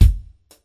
short reverb bass drum single hit f sharp key

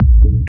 damped dark techno kick; single hits one shots; kick drum samples

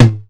low mid tom drum sample a sharp key

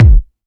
rich low end kick drum F key; tuned drums f key

